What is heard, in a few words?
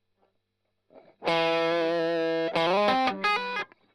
Strum Line